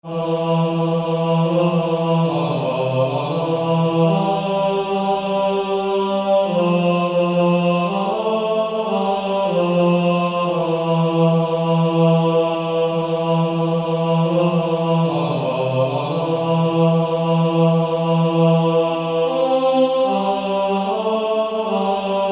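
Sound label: Male Choir, bass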